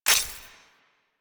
Railjack UI Mod Install B; DE; Warframe; Railjack Player Ship

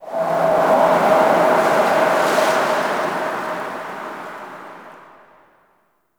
Exterior Forest Wind; Warframe; Ambience; Duviri

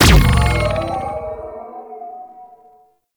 Overguard Pulse Repeat; Warframe; Infested; Ancient; Protector Ancient

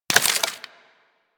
Bullet Impact Debris Wood

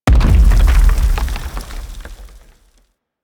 Orb Intro Rock Landing; Warframe; Ambience; Venus Open World; Objective Rooms; Venus Obj Deck Twelve

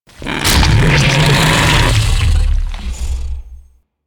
Ancient Tank Attack, Infested, Barks; Warframe

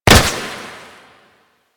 Flak Cannon Proj Hit; Grineer

